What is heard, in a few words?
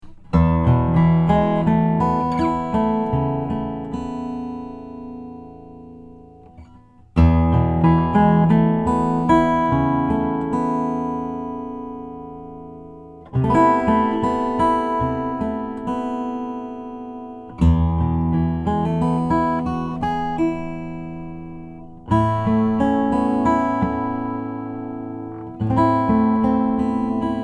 Kraut mod d fan fret macassar ebony